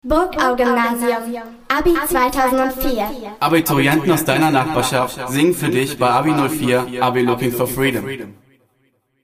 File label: Vocals